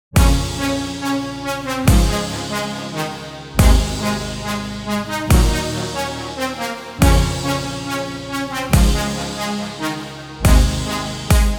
instrumental

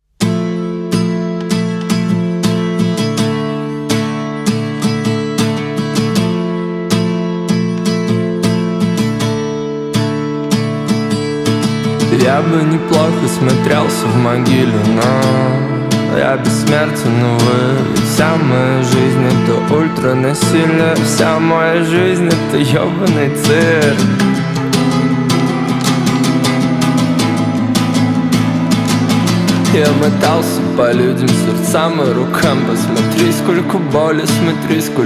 acoustic version

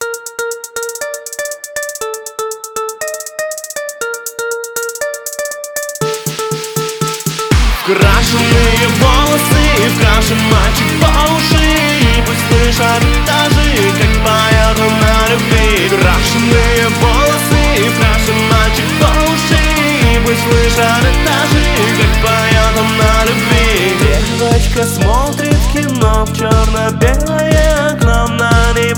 instrumental

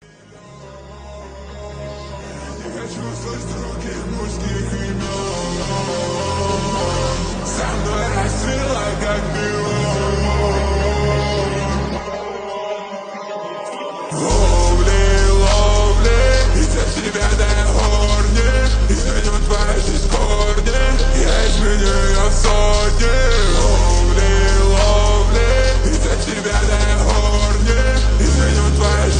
slowed

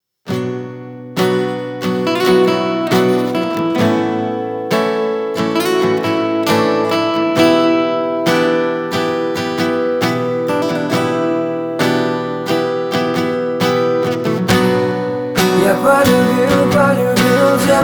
acoustic version

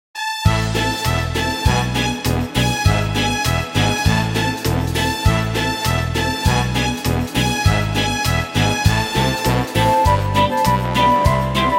Children Vocal Band

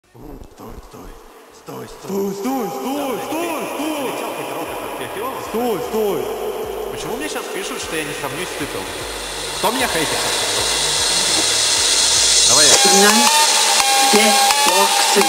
phonk remix

